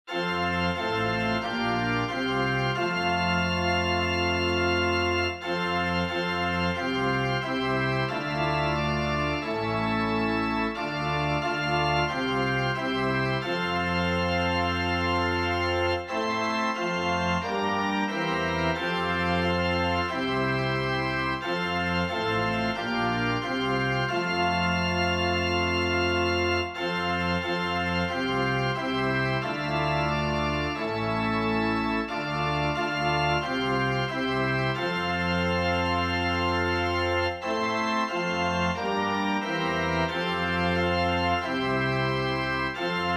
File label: jesus stand among us organ